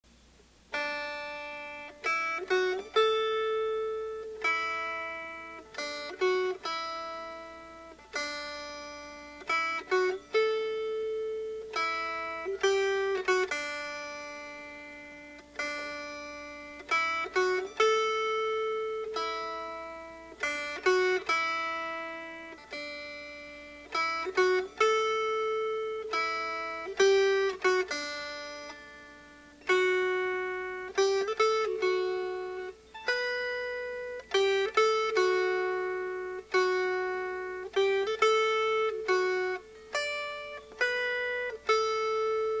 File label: Suo Gam Melody